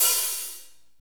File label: HAT, Drumscapes Roland; Northstar; Fast Shuff